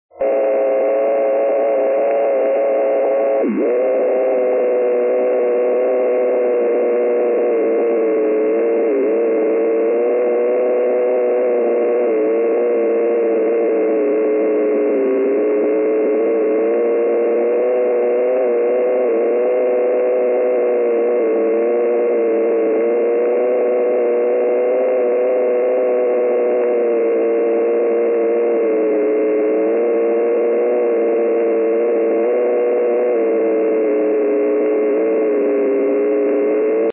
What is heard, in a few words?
125khz growler; 2200m; lf